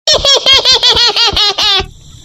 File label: baby laugh; grinch; made with Voicemod